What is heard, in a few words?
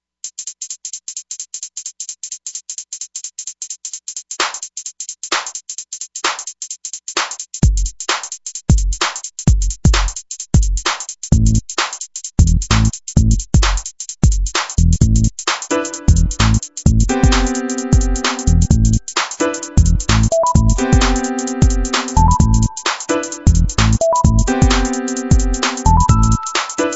2step